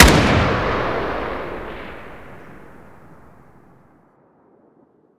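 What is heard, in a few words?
fire dist 762x39 rifle ext; gmod; arccw uc commons attachments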